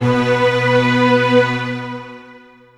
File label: Big strings B2